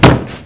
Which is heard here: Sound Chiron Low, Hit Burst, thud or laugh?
thud